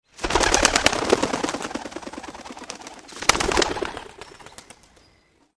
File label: Wing Flaps; budgerigar small bird